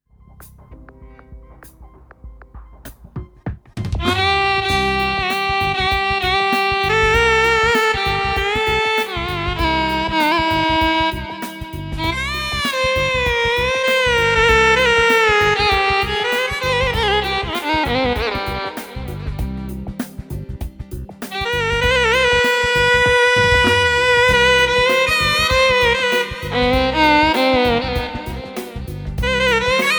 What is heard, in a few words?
Violin Seka E; Pa2X